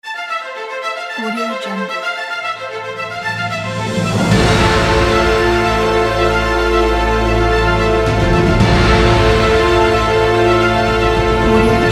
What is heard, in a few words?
anxiety